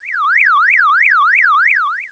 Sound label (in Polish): syrena